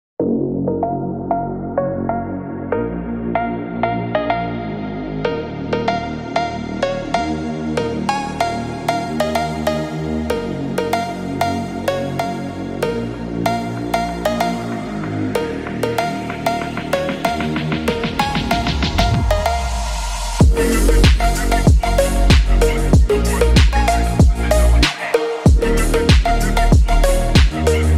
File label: ringtone, cool